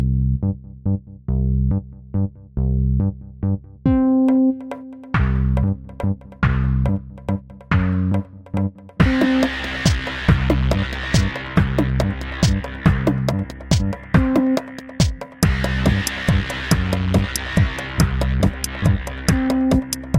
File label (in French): Percussifs Instrumental